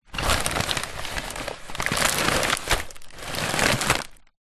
food popcorn paper bag open full movements

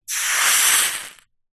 foley metal hot place in water sizzle; zapsplat

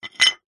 foley wall tile small set down on concrete; zapsplat

